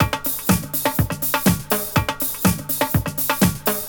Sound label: BRATATA